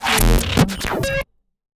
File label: glitch